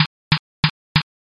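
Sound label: footsteps asphalt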